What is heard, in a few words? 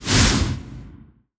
win sweep Horizontal; netent